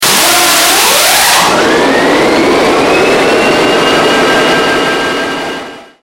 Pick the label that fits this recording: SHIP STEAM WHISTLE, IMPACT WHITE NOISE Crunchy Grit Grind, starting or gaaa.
starting